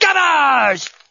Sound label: gobbles